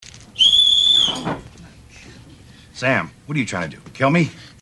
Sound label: whistle; Freaks and Geeks; "Tricks and Treats"